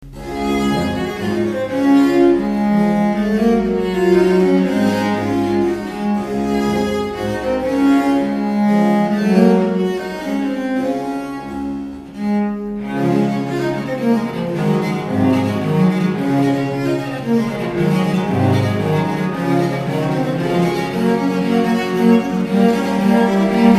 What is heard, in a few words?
E moll menuet; Abel